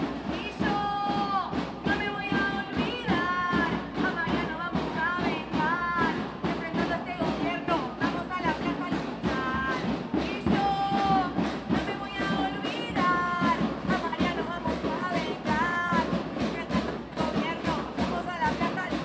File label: Song; march in buenos aires commemorates coup demands justice